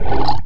gurp; cero

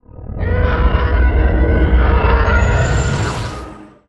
starship; spawngirlsounds

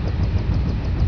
fan

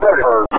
daed pain; rogue; daedalus

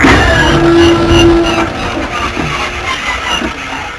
death; walker

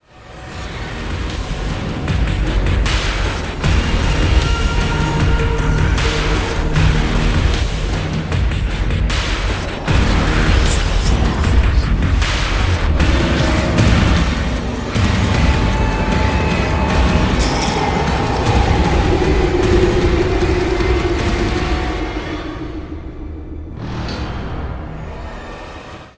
broken music; spawngirlsounds

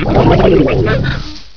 DROWN; opentdm

